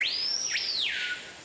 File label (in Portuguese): canto do capitao do mato